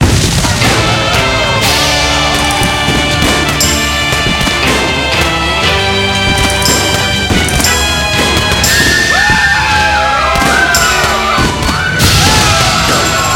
mega Win No Loop; wild wild west

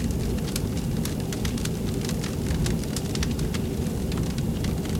fire loop; hiddencoinsofegypt

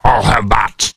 map Search Decide; rage of the seas

